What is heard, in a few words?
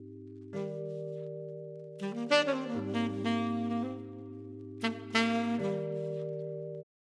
sax; Audio Processing; Python